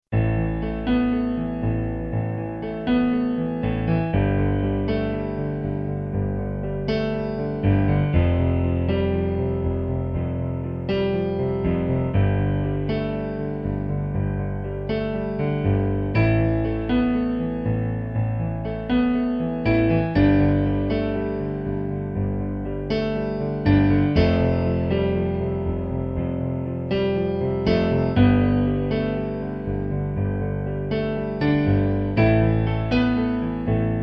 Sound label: piano